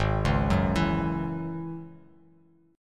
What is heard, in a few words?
G sharp 7th Suspended 4th Sharp 5th G sharp, Bass Standard; G#7sus4#5 arpeggiated; 4,4,4,9; JGuitar